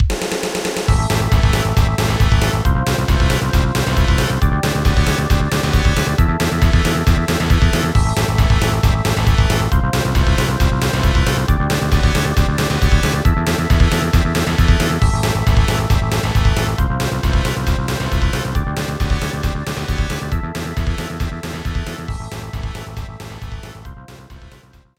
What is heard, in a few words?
Level Up; Shin Megami Tensei if...; PSX